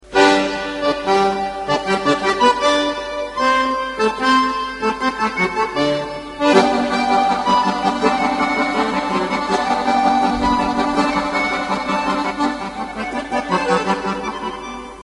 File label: accordian